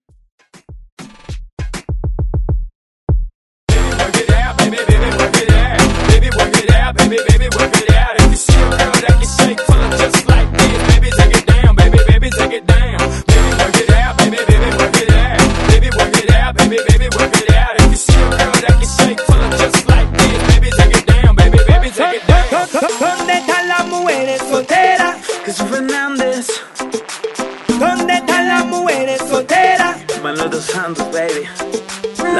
Moombah Hype

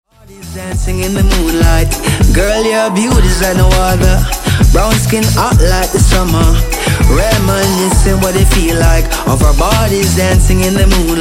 Intro Outro 100 Bpm

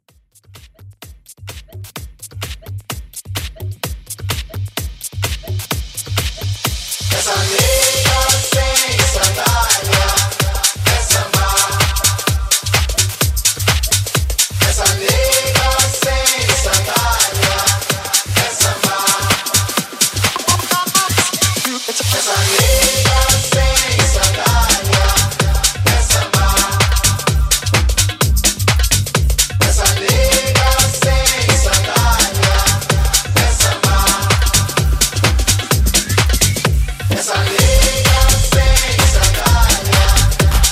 Tribal House 128 BPM

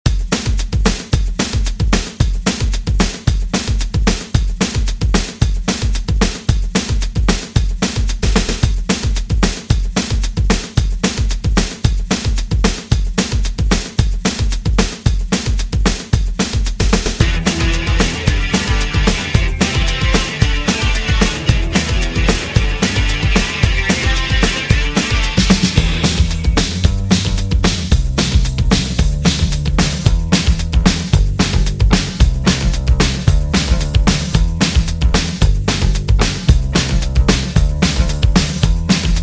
Funk 80s Redrum 112Bpm

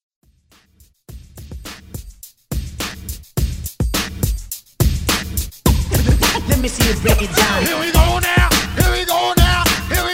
Old School Party Break

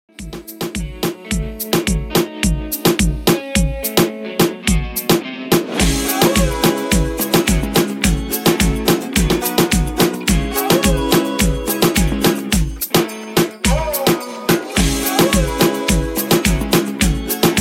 Reggaeton, 107Bpm

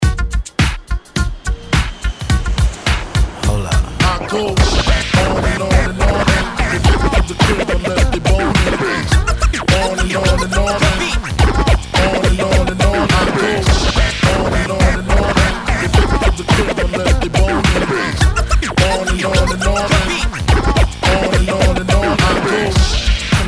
Throwback Scratch Remixx; DJDOLLS

